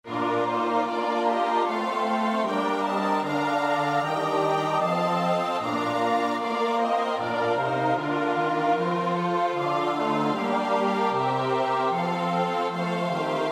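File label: soprano alto tenor bass; Bach (Johann Sebastiaan); BWV 097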